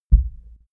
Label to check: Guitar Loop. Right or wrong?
Wrong